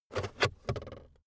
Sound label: car backseat table pull out cupholder heavy